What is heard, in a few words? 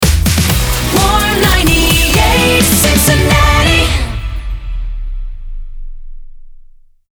TOH 128bpm D Rapid Fire, jingle; Warm 98